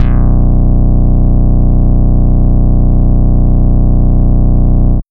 THUMB BASS; USB Soundscan vol.12

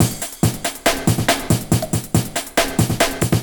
beat - full, grime, 140bpm; Zero-G Sound Sense Grime Scene; Construction Kits; Its Primetime

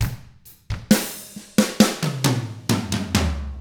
SLOW ROCK, Drums; ILIO; Double Platinum Drums 1

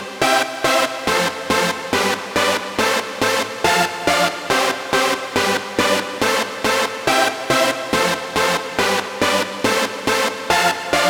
140BPM C; Vengeance - Essential Clubsounds Vol.4

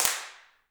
handclaps, Heart of Africa; Spectrasonics; Heart of Africa 1

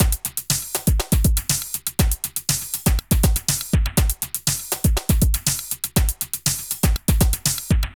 Drum Loops

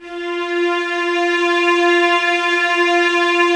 violas F 4, String Ensembles; Miroslav Vitous; VLS 1+2

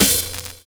fill

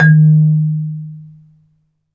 MARIMBA; ILIO

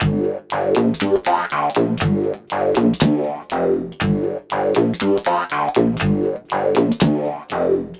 Odd Bass; Basses; Dr. Fink's Funk Factory

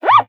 FRET, FINGER MUTE; Xsample VOL-1

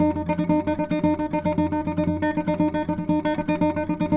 NYL TR; Spectrasonics; Hans Zimmer Guitars VOL-2; FLAMENCO FX

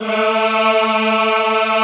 EHH A2, Classical Choir; Best Service

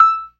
PERC, ORGAN; Keith Emerson Signature Series

